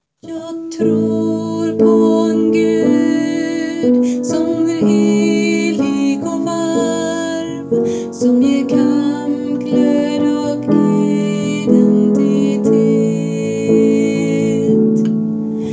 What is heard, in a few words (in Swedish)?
bas; trosbekannelsen